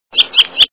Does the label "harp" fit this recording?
No